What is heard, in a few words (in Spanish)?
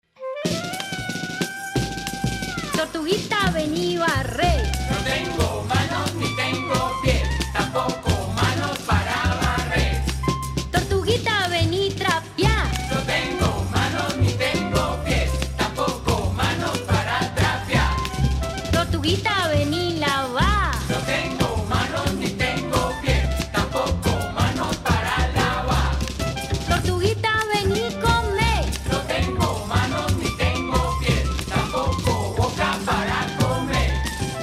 Tortuguita vení bailá Juego afro, Pacífico norte